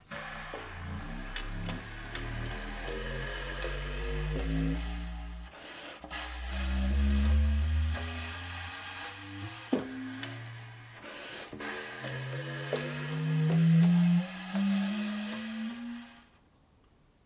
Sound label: more pitch